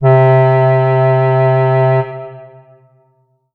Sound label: Ensoniq SQ-2 Bassoon C4